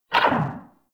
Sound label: Pulse Laser Tail; Warframe; Railjack; RailJack Weapons